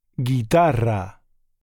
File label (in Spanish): guitarra